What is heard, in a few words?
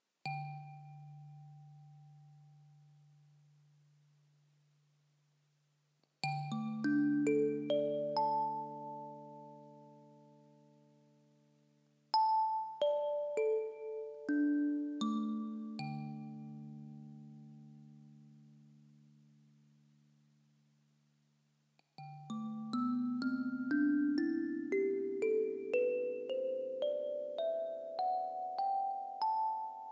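D Mixo 15, mbira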